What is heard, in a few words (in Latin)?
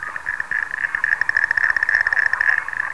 Oxyura leucocephala; pticy; utki